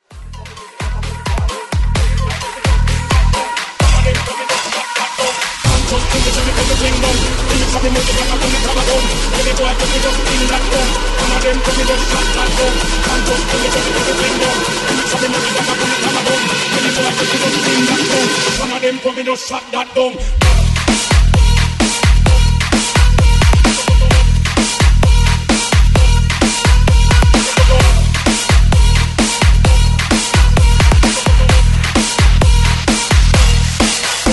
130 BREAK MIX; DJDOLLS; BREAKMIXSMASHER

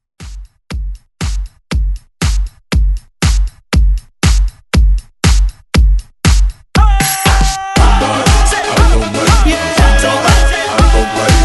Hype ReDrum 119 Bpm

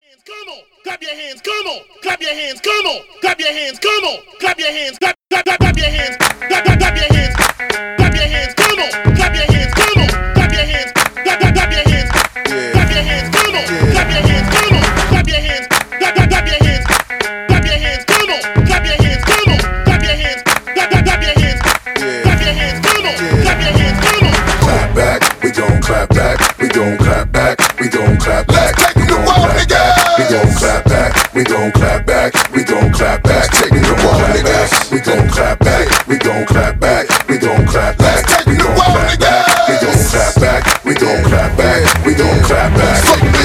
Acapella Intro 101 BPM

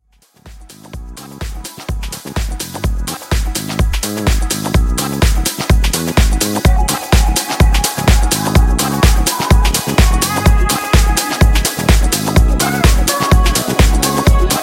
Deep House Mashup 126 Bpm

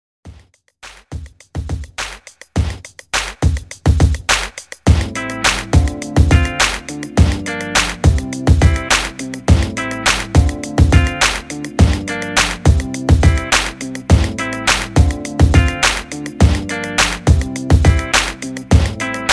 REDRUM 104 BPM

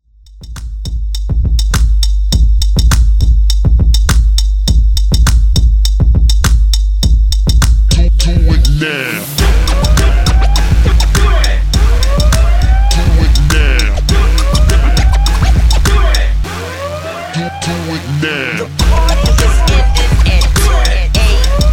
Re Drum Dirty 102 bpm

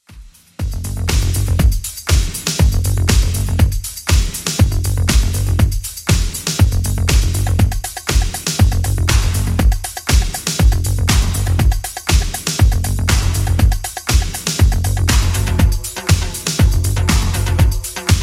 80s Redrum 120bpm

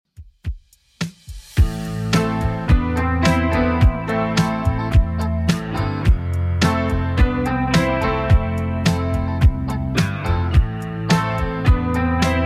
70s Redrum 107bpm

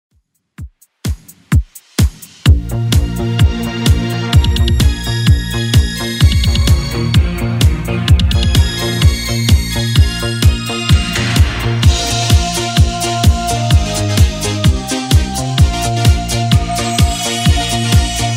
80s Redrum 128bpm

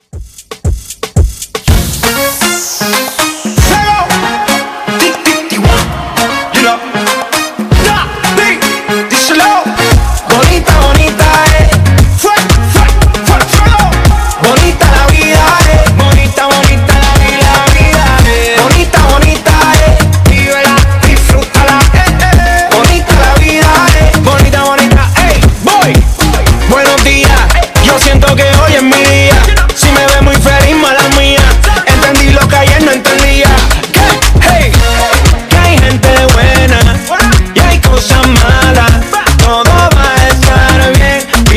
Funky Reggaeton Redrum

